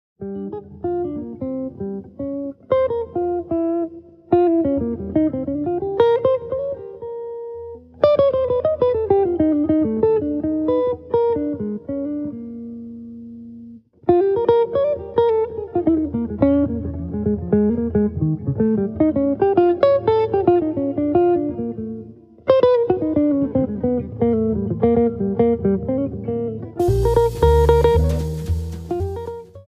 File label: daily grind blues